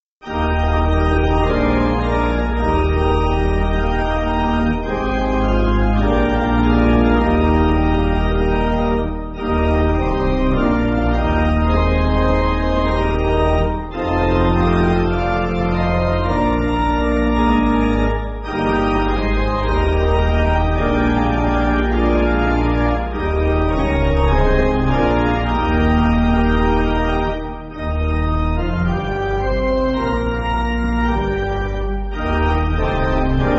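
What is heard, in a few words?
Pipe Organ, church backing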